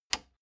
plastic button latch loose release disable

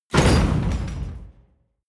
gate slam small

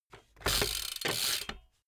bmx bike pedal grip freewheel

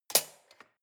cutlery large drawer rattle hard

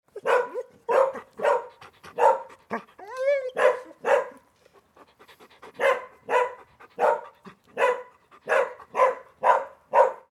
dogs barking whimpering hackling

